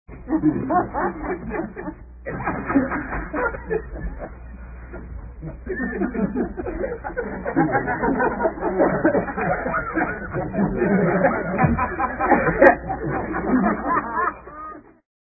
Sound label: Men Laughing, Answering Machine Messages